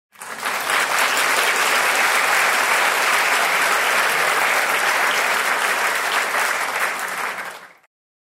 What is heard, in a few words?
audience clapping